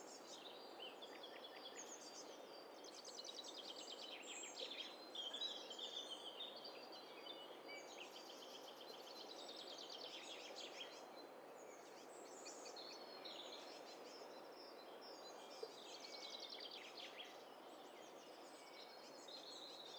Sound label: STEREO BIRD COCKTAIL PARTY